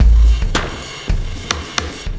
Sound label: Sky Lab Beat, Processed Drumkits